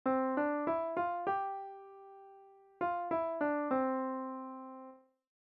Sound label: ascending scale